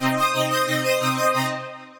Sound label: Arp Strings, Synth Rhythmic; Ableton; Factory Packs; Synth Essentials